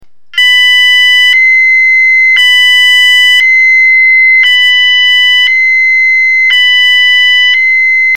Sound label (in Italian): BITONALE LENTO 1000-2000HZ